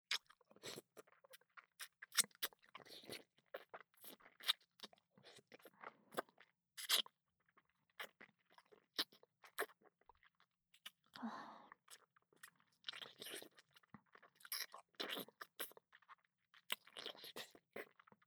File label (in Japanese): キス, ASMR; アドリブ集